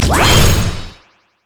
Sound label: shield; rumble skin03